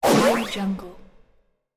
spell cast

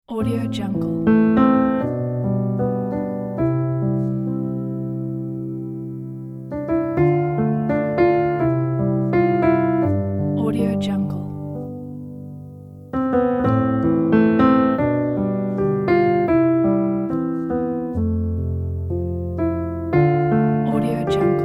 melancholic solo piano

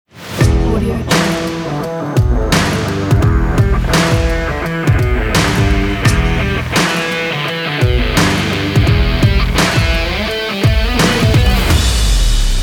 stylish indie rock

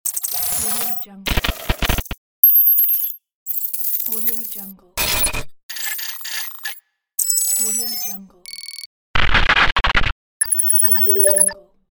data glitch

